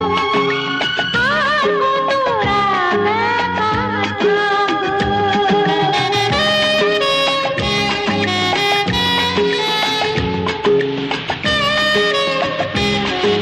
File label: bengali ringtone